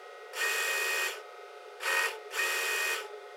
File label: tech oneshot, office